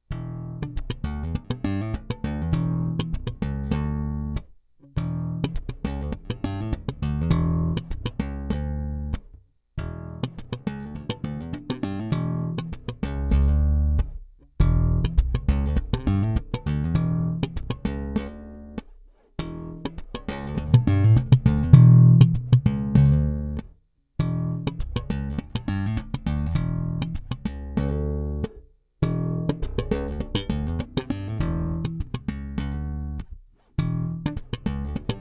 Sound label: Pedal BEQ